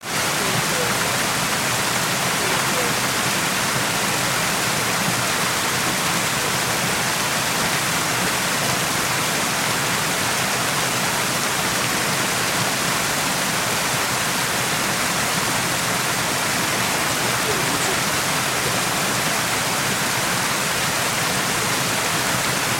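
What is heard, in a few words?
Mountain Stream Rush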